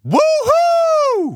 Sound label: taunt woohoo; Counter Strike; CS:GO; taunts